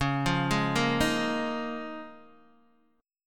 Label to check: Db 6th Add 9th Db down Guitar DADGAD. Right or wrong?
Right